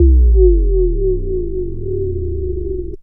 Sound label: Bass Lick, Drum & Bass; Fluid Dynamics